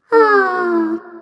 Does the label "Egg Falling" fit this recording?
Yes